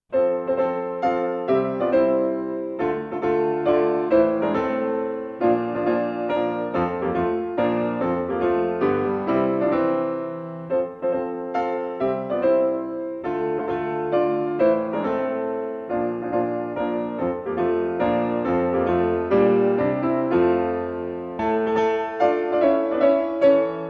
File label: Mazurka No.48 in F major, piano